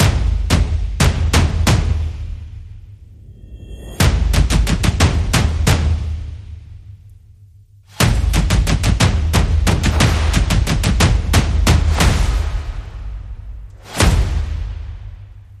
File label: Enemy Tribe, Percussion Only